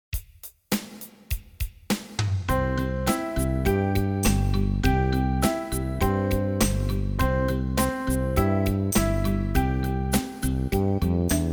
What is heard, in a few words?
Warm Up With 3rds Slow, piano